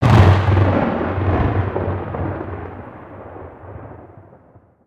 Wing Lightning, ambience; Ninjago

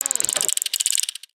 Broombot Whirl, creatures mechanical; Inventor Broombot

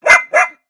Pet Dog Robotic Happy; creatures pets; Lego

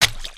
paint splats

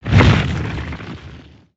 Earth Swing; Spinjitsu

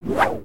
Torch Spin; build mode

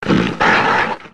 Gorilla Hit Vox; creatures organic

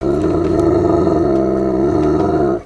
dog bark

